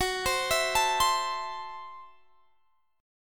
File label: Gb Minor 7th Flat 5th, Guitar Standard; arpeggiated; JGuitar